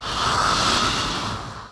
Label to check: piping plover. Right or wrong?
Wrong